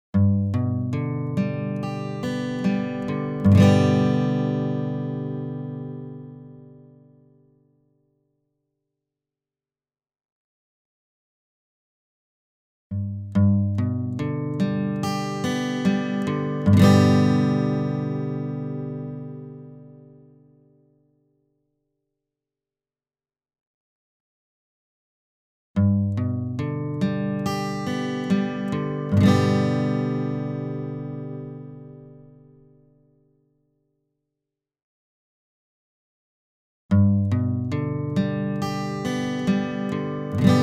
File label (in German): mic gitarre